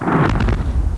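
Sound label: explosion big; America Invades! Demo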